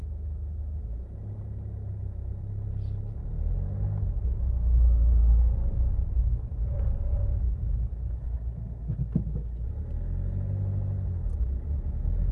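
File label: vehicle car citroen c2 drive trunk; mkh8060; pmsfx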